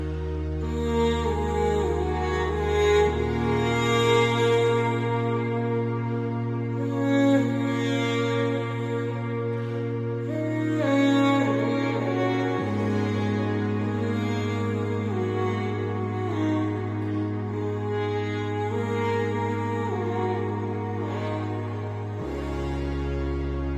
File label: english music ringtone